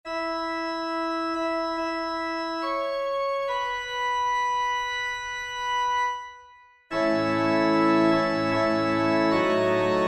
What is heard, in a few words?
Organ, hymn; God be in my head; Book of Hours; Hymnal 1982